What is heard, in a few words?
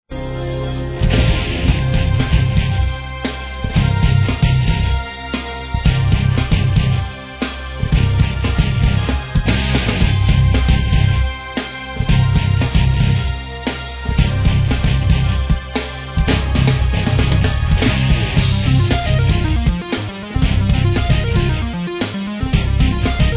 improgressive